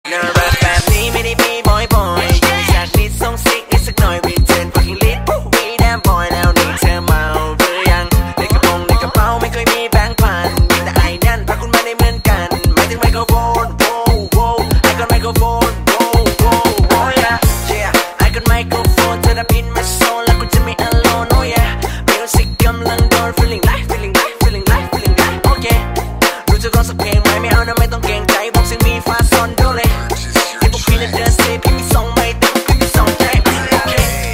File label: microphone remix